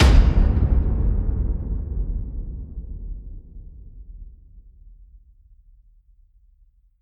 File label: simple confirm